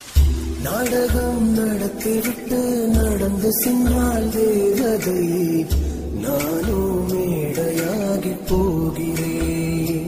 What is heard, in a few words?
tamil love sad ringtone